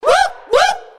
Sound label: whoop whoop